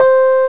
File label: Rhodes (C5); Star Trek JR Audio